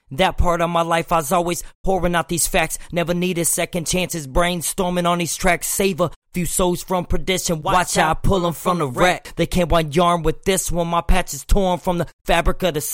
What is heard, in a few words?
HH 55 FM